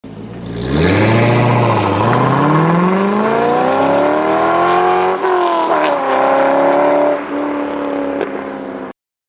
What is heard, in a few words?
launch